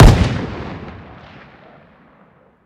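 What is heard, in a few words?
weapon lmg fire player atmo ext; gmod; viper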